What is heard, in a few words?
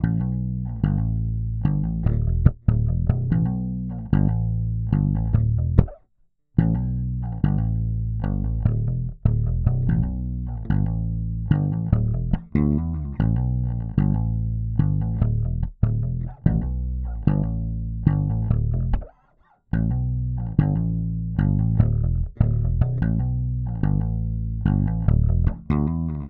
Bass loop 73 Em, Electric Bass Loops Direct; Slate Digital BASSHOLE Sample Pack